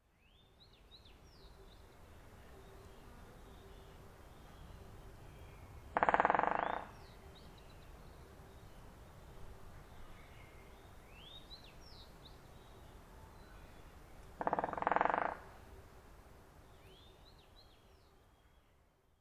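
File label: Great Spotted Woodpecker; akagera; osado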